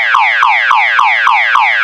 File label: siren